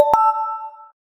Charging Started

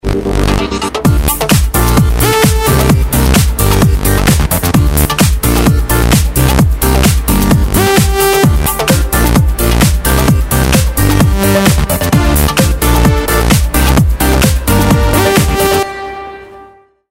U generation, ringtones; Samsung; Galaxy Core

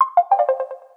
cancel

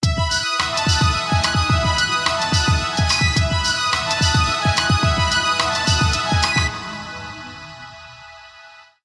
string ensemble; Sony Xperia C

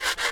motion wave; SKY IM-A890S-VEGA-Secret-Note

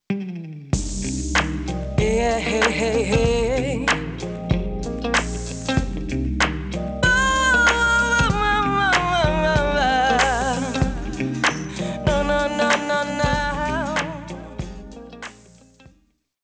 Shimmer; Nokia

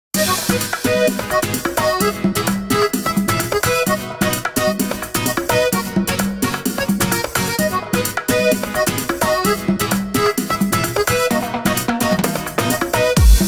Sizzle Brazil; Nokia; Nokia Tones; Windows Phone apps

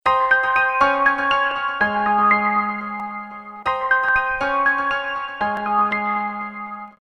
Power On; teXet; TM-D108

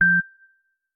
Beep Once, ringtones; Samsung Galaxy Z Fold8 Ultra; Sound Theme

